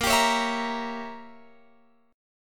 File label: A sharp Diminished 7th, Guitar Open F; x,17,19,20,19,17; strummed